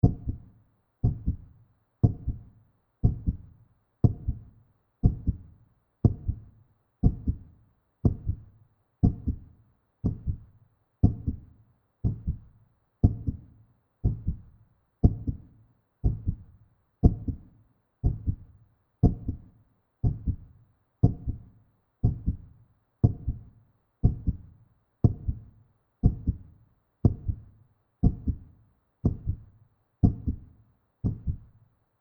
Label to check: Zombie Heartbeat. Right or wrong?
Right